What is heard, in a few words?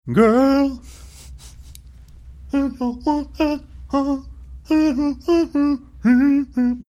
singing like manner